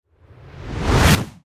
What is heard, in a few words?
Horror Magic Spell Whoosh Impact; SFX Bible 6